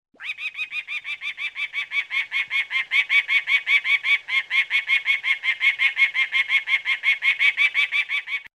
peregrine falcon; calls; Audubon

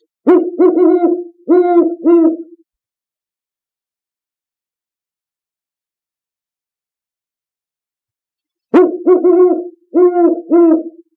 great horned owl; calls; Audubon

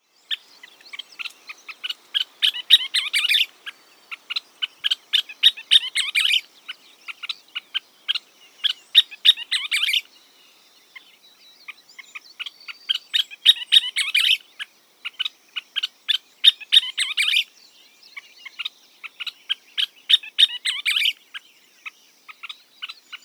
scissor tailed flycatcher; calls; Audubon

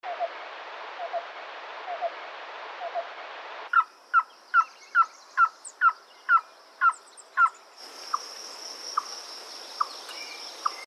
black billed cuckoo; calls; Audubon

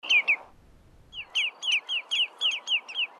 lesser yellowlegs, calls; Audubon

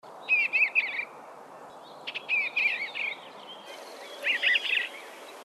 e bluebird; calls; Audubon

